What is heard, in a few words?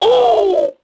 Hit; Star Wars Knights Of The Old Republic II; XBOX